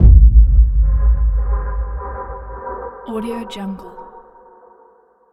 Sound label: cinematic deep impact downer